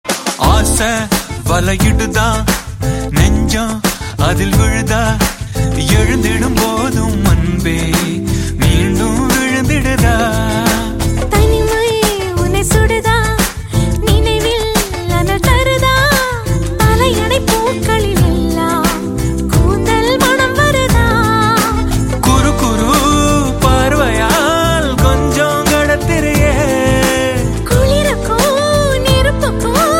Tamil Ringtone

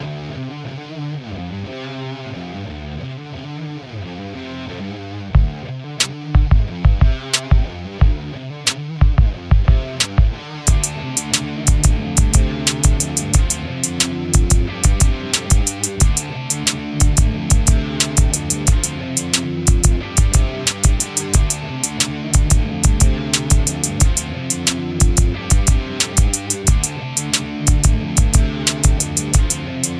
Guitar solo

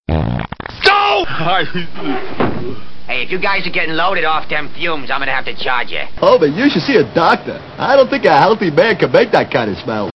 Fart

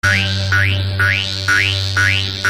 Toing

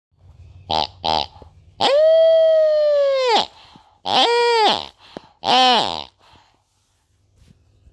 Toy pig

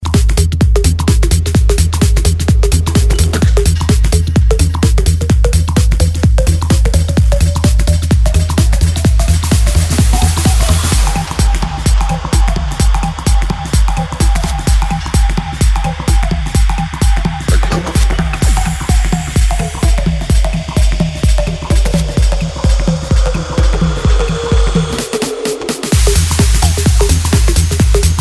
Dutch Electro

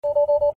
Tesla Warning

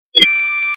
Light chime

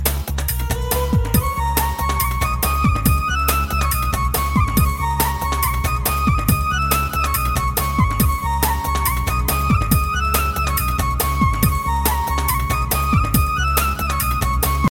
Nice Music

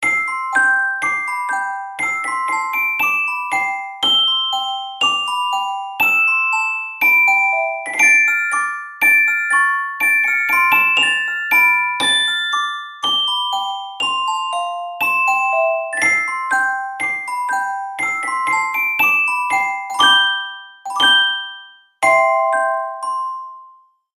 Christmas Bells

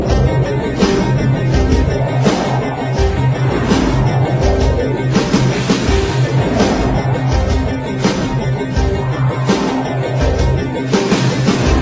Oriental

